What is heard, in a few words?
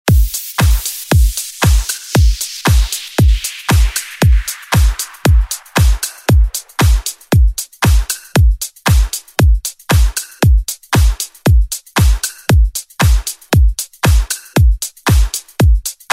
Club redrum Clean